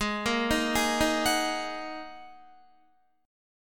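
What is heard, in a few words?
A flat 7th Suspended 2nd A flat Guitar, chord; arpeggiated; 16,13,13,13,15,13; 4E,4A,5D,5G,5C,6F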